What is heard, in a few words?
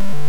error; ultima4